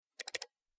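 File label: Low pitched Mouse Single, mouse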